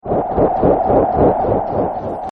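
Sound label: AMB LOOP, Sci Fi Atmospheres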